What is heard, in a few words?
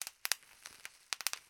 foley packaging bubble wrap pops; sound effects one